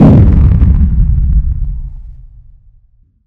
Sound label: gun